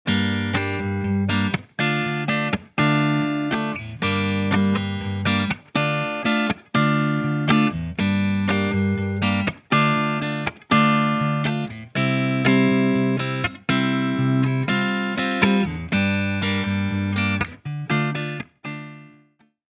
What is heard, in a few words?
Unresolving Progression